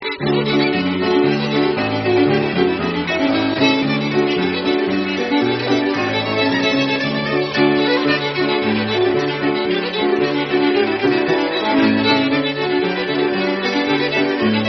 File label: Hornpipe